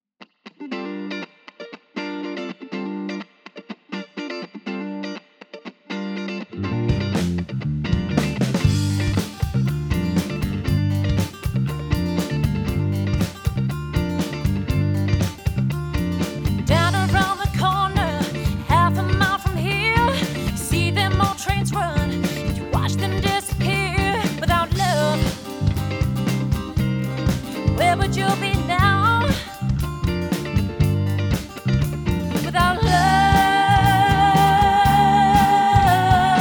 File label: Live 5 Piece Band; Not Til 4